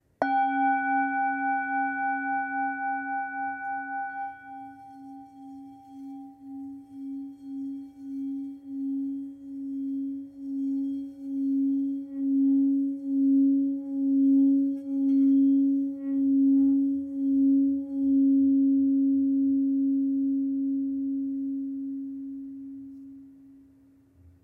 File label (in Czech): tibetska misa